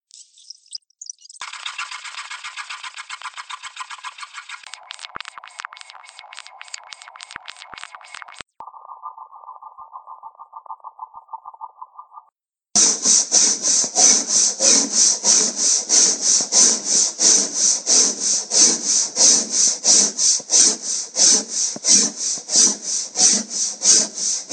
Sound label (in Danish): nat i byen